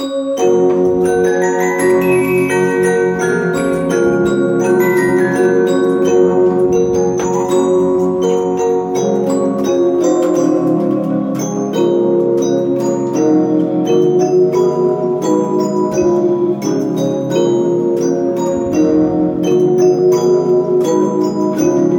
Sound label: Reginaphone Complete